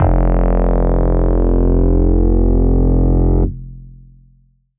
bass F1, Casio VZ10M; Polyend; Simulacrum Sounds; 1 Shots; Synths

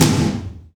TOM TOM

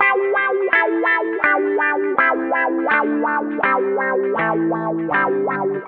GUITAR

